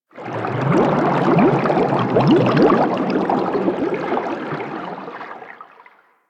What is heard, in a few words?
Sharkwing Movement Bubble, Vehicles; Warframe